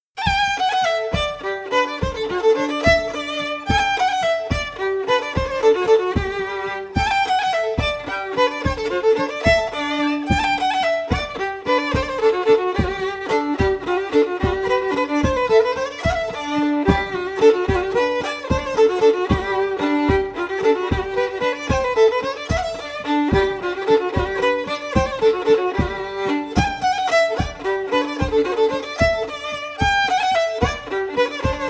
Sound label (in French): Bourrée; Henri tournadre